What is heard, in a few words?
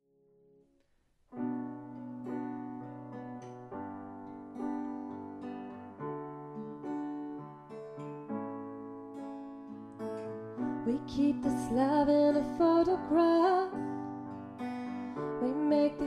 Acoustic Female Cover